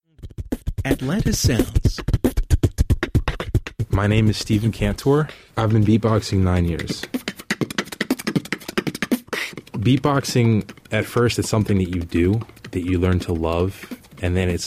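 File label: Beatboxer, atlanta sounds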